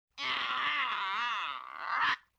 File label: LoFi Synthetic Bird Caw; Vintage Cartoon; Comedy Vocals